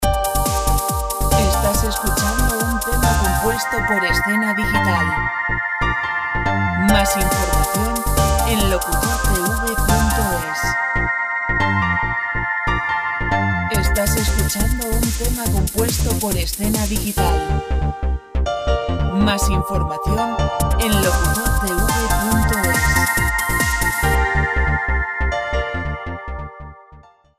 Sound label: dance